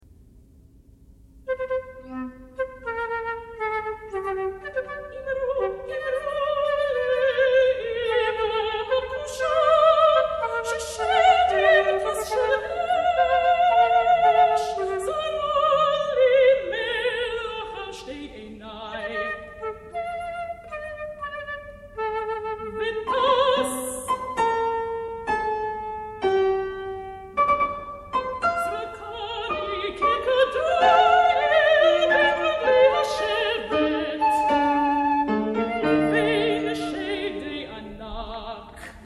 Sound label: soprano, flute and piano, sung in Hebrew